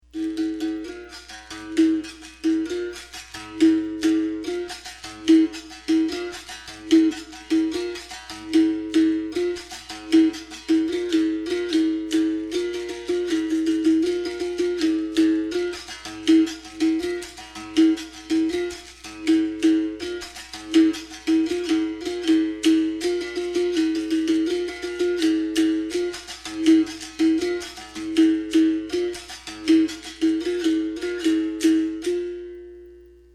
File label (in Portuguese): toque amazonas, capoeira; toques